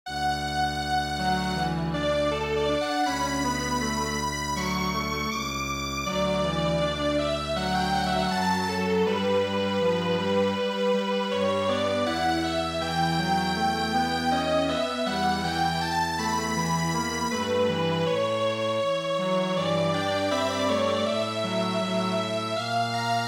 Meditation Strings Quartet; midi; traditional

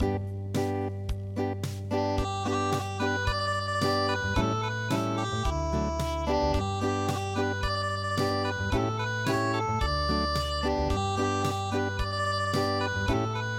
thingaringarin unpluged

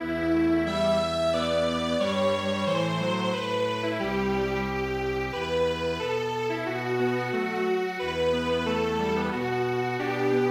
Elegie Strings Quartet; midi; traditional

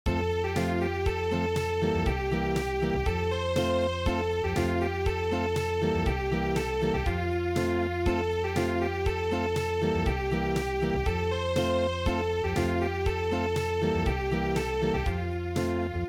Acoustic

